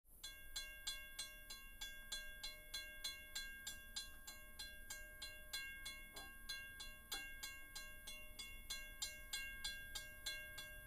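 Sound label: railway crossing sounds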